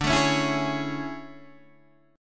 A Major 7th D

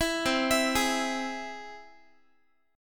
C Augmented down Guitar; arpeggiated; 19,20,19,18,x,x

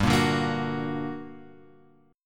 G Minor Major 7th, guitar chord; GmM7 Chord {3 1 0 3 X 2} strummed

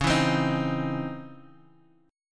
Db Minor Major 9th Db Guitar Half Step Down; DbmM9

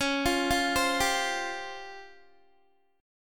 Db Major 7th Flat 5th Db down Guitar; 4F,4A,5C,5G,5C,6E; arpeggiated; chord shape; JGuitar

